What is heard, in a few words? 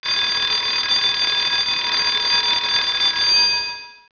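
alarm bell; Microsoft Graphics Studio; Home Publishing 99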